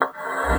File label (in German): LUFT, bassoon; Xsample VOL-6